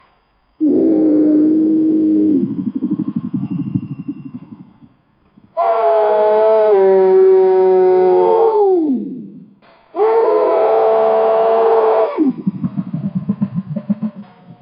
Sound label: humpback whale; marine life